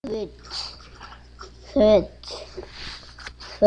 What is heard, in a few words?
switch